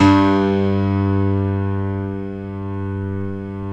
DBL GRAND; OMI - Universe of Sounds

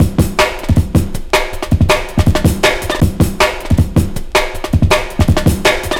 160, Drum Loops; Total Drum&Bass

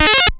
Pit jump, ATARI

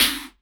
Elektron Monomachine, Drum Machines

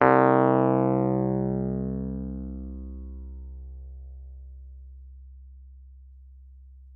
WUFF C2, WURLITZER; Xsample VOL-1

